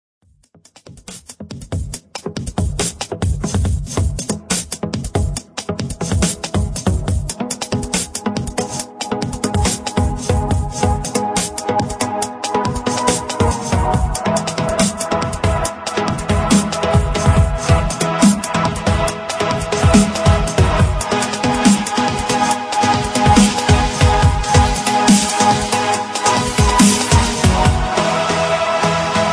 dubstep intense

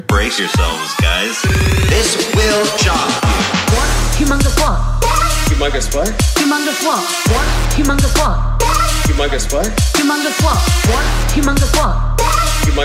Is Calling Ska, ringtones

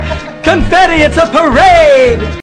blues man

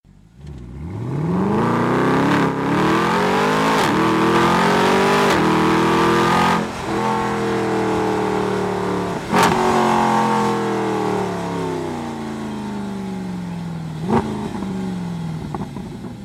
mb sls amg roadster; ringtones

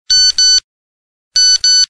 classic text message; ringtones; sms

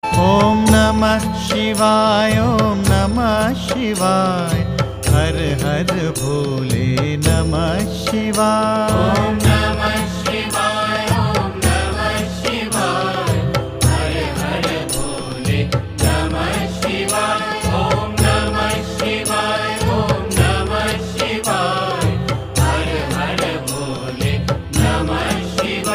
om omkar om

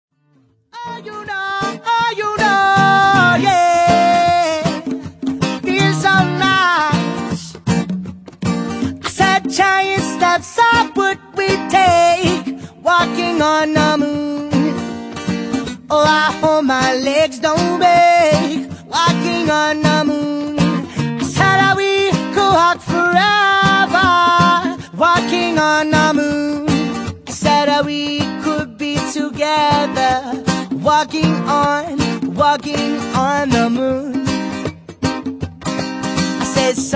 acoustic

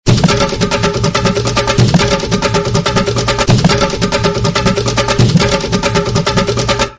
African Drums

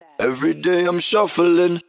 best cool sms

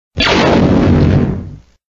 gun sound

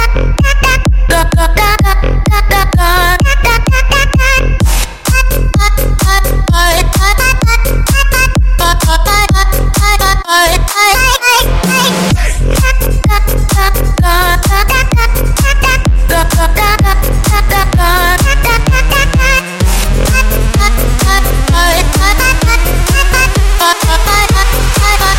Electro House

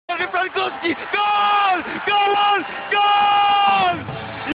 goal

